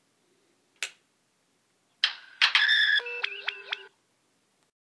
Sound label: my iPhone battery charging sound